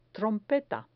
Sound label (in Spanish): trompeta